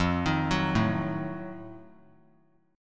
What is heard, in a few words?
F 7th Flat 9th F down; F7b9 arpeggiated